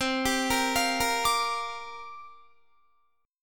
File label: C 9th Suspended 4th C down Guitar 4F, 4A, 5C, 5G, 5C, 6E; C9sus4 arpeggiated